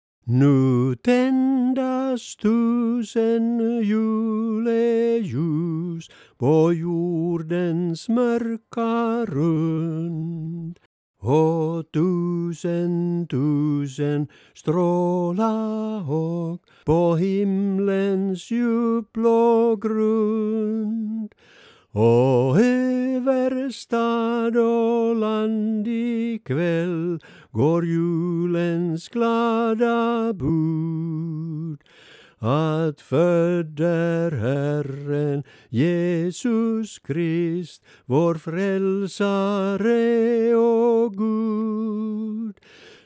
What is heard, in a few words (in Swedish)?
tenor